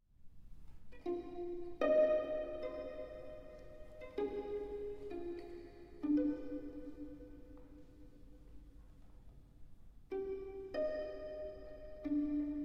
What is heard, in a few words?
For Amplified Violin; Rosace I (Version 2)